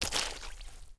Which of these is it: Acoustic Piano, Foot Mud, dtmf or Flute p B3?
Foot Mud